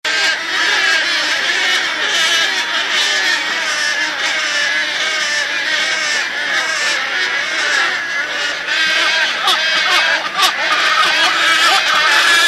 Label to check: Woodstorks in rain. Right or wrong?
Right